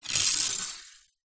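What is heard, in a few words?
leisure fishing rod scrape ground; zapsplat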